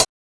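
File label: HH, hi-hat; X-Static Goldmine 1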